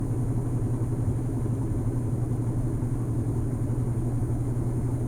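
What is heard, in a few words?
ceiling Fan Noise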